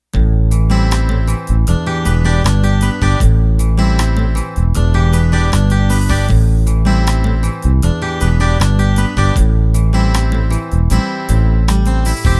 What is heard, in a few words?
16beat